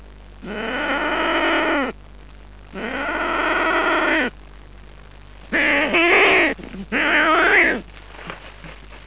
bird unknow weird growling sound

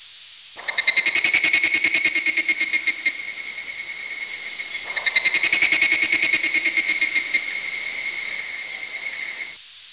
cicadas buzz with echo

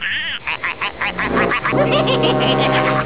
evil laugh

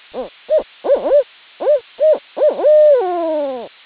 barn owl hoots

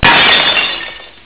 big glass shatters

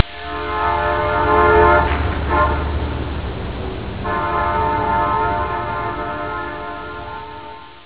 night train

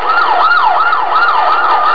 ambulance siren

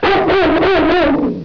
big dog barks four times

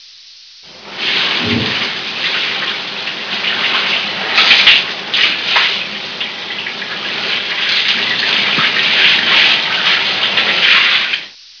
person takes shower

